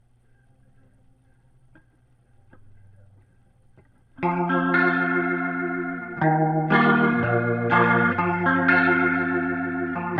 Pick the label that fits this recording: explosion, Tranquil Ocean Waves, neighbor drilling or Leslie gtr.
Leslie gtr